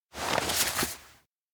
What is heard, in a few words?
note appear; anemoi